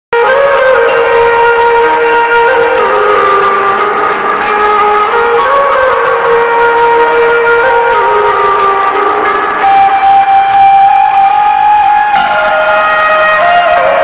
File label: new viral ringtone hindi